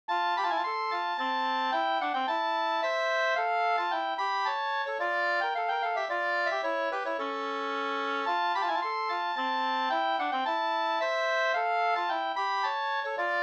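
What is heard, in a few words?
polska, two-part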